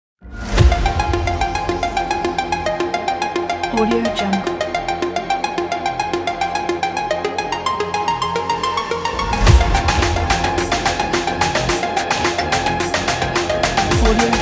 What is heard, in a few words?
dark industrial cyberpunk